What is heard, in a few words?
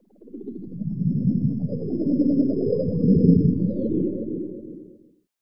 carousel idle; STALZONE; somber anomaly